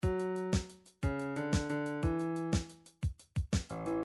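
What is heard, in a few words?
midi drums shuffle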